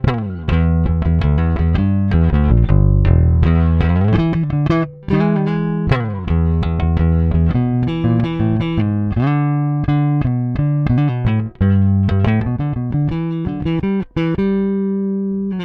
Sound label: Bass direct